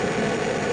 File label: boost Loop; gamerip; temple run